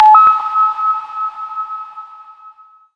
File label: SHORT, SHORTNOIS